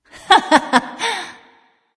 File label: hehheh; daji